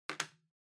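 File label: Portable Stereo Remote Control Put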